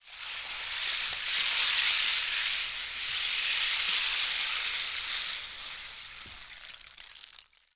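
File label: rain stick; Spectrasonics; Heart of Africa 1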